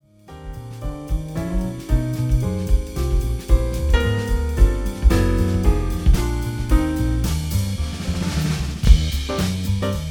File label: jazz trio